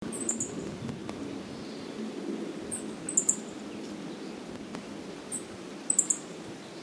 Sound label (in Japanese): hooziro